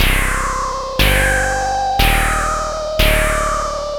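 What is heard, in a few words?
Envelope Noise Pad, Synth SFX; Sonic Foundry (Sony Creative Software); The Electronic Music Manuscript Collection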